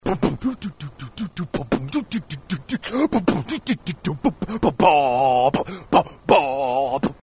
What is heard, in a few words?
south park reality show music